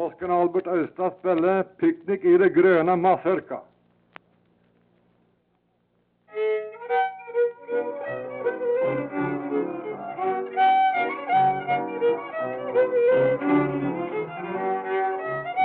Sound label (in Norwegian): MUSIKK; amerikanorsk; einar haugen